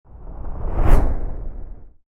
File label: Fire Whoosh